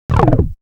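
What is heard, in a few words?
Turntable; AMG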